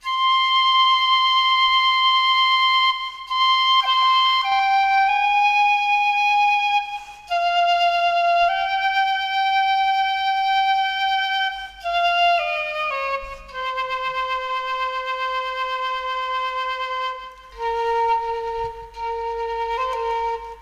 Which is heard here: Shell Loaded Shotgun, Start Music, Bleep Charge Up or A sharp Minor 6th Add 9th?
Start Music